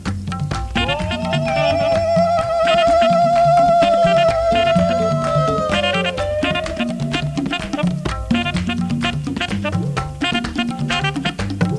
RHYTH

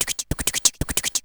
BEAT; SCALA

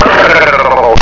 tig growl

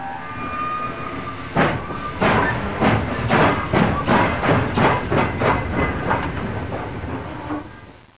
CHUG

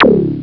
stunner; alienfrenzy

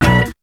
shot; BEAT2000

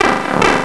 PIPEDOWN

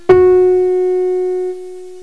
PIANO NOTE

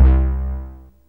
BUZZ; BEAT2000

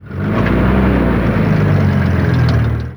TRUCK; TOAW

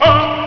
gasp, human

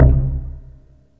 PLUCK; BEAT2000

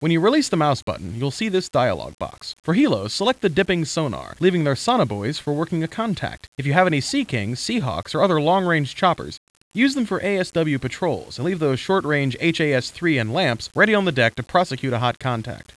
HARP

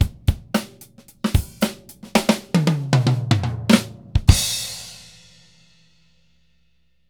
END, DRUMS, LOOPS; cubase; CUBASIS

